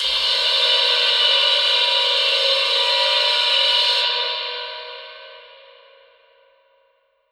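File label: Reverb Proc, alarm siren samples, 120bpm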